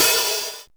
SP1200, DRUMMACHINES

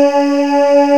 CHOIR

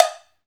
CowBells; Club-50 - Foundations Roland; CowBel+Metl

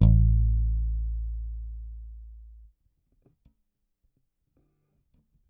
Whole Note One Shot A, Bass; Bass X

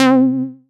Synths Root C

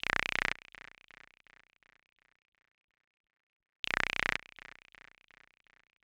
Syn Fx Loop 125; Equipped Music

